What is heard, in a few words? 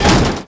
damage metal